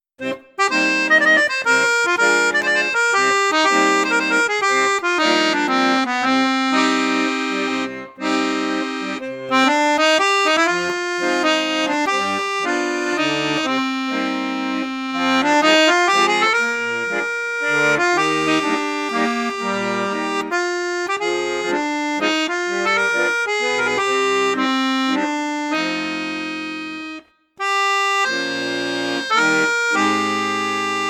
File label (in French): registre flute juste, accordeon; crucianelli